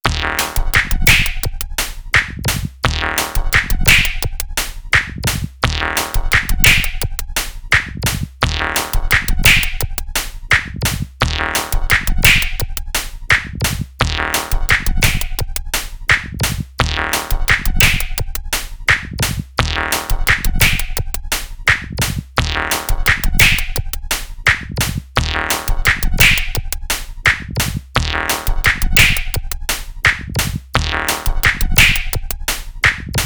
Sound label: 172 2A dnb loop; iiogama